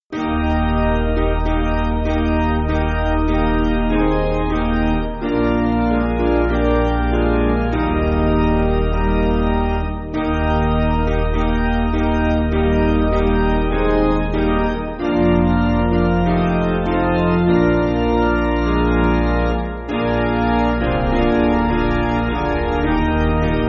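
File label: Piano, Backing Files for Churches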